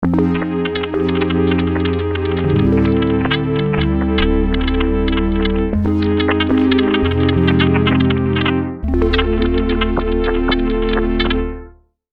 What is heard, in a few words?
Alien Race, Prophet '08